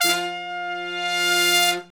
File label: BRS, Brass Sections, R&R Horns; Roland LCDP06 Brass Sections